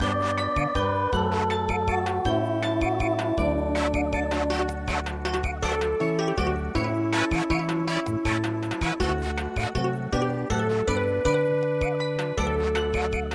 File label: Samba; Heidenroslein Werner